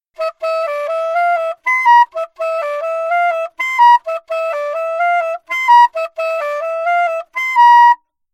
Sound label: altosax